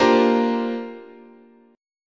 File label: A sharp 6th Add 9th, chord, guitar